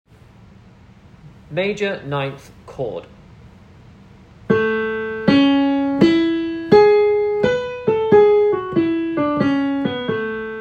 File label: Major 9 chord